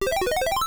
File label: bonus destroy